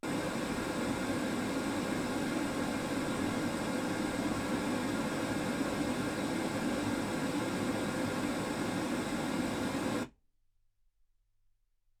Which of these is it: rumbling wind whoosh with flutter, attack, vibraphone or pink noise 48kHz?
pink noise 48kHz